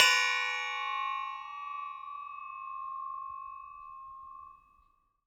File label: Metal Teapot Stand Strike